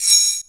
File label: Ambient Rasp; Digital Sound Factory